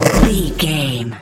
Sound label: sci-fi spark electric device hum